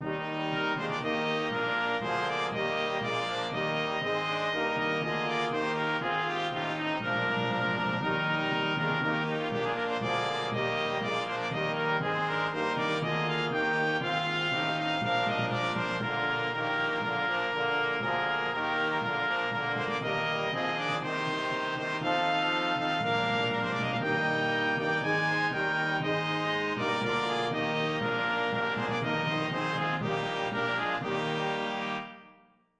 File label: Hymn BRASS